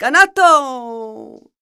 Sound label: voc, Flamenco Female Cantaora; Best Service ProSamples vol.46 - Flamenco; Vocals; Female Cantaora 1